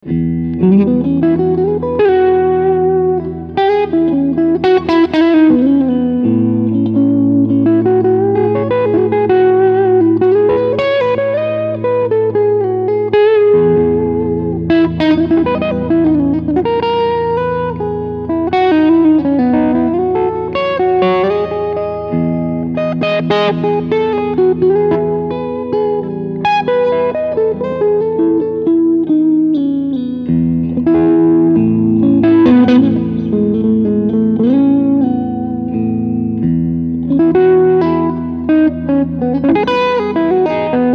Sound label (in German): Hals SC S-1 ON